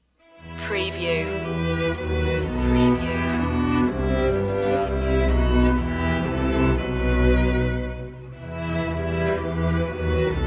string quintet; god rest ye merry gentlemen

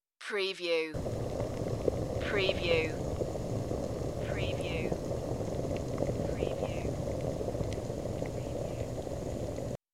Boiling

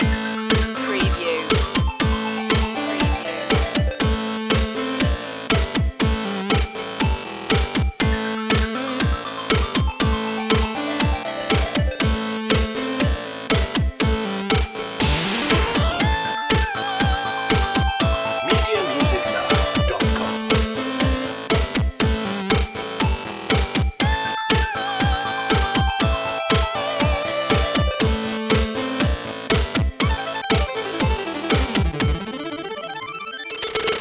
retro video game loop

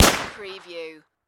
Submachine Gun Sten

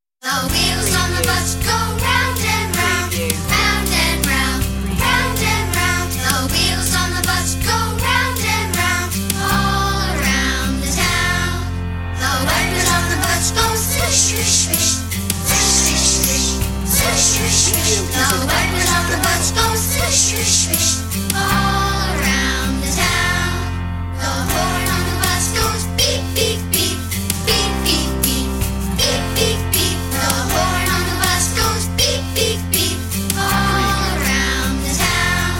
children group vocal no sound-fx

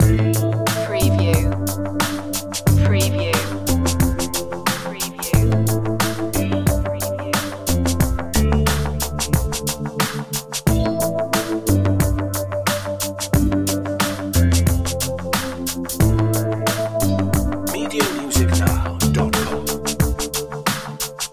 hip hop loop

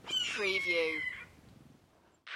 Red Tail Hawk Single Call

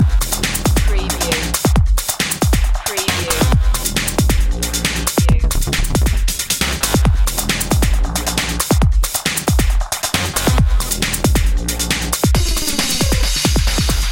start again loop

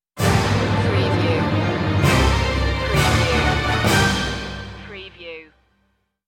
sting no choir

